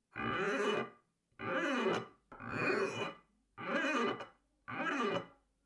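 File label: clean dishes sound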